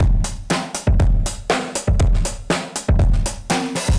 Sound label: fingersnap, Drum loops, Boombap